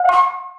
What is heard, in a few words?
continue